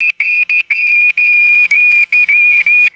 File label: rapid high pitched electronic alarm beeps; alarms